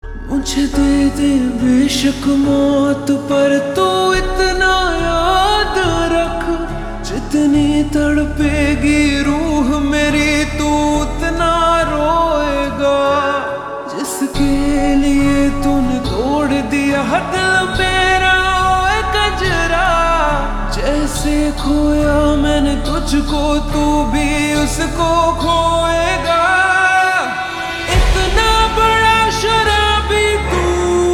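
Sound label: Ringtone Slowed Reverb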